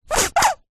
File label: household shampoo bottle squeeze empty